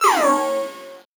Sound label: VocoBit Noise, 8-bit; musicradar; 8-bit bonanza